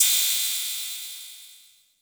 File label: Ride; Drums; Ableton Live 12 Lite Core Library